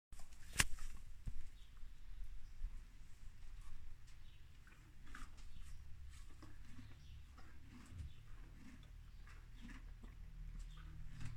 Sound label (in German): Kauen Fressen Heu; Luna